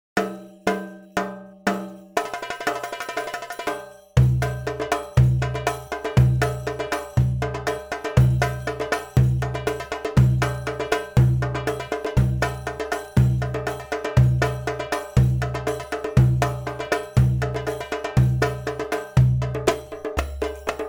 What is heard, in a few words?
ibrahim 120bpm, drumsolo